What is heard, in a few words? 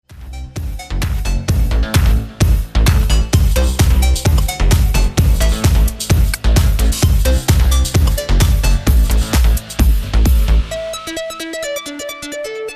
dance music